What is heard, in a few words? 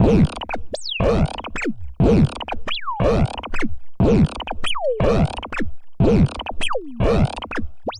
Marching Rubber Aliens